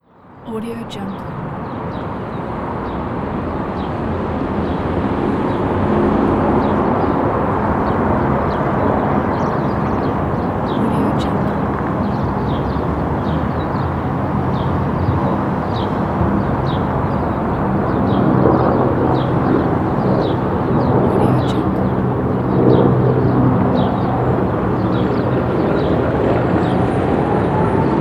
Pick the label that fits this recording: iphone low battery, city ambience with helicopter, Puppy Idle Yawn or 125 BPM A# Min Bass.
city ambience with helicopter